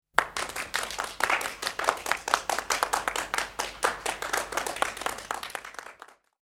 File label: Small Applause